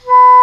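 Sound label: English Horn p B2; Multisamples; Digital Sound Factory Virtuoso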